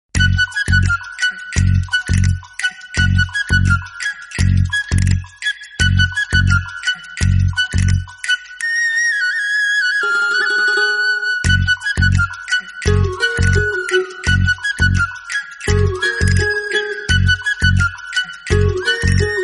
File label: flute